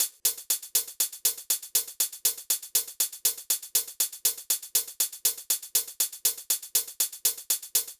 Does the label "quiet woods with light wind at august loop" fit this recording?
No